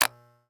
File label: Electronic, Latch, click, heavy, clippers, on; Eiravaein Works; Vaeyan IV